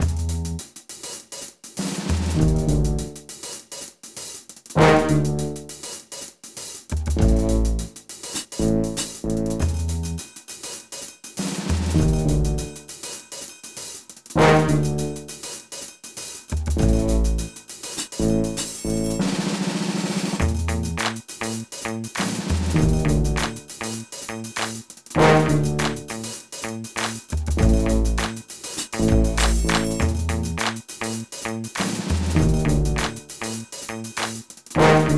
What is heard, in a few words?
Circus Suspense; crazy circus 2009 nds gamerip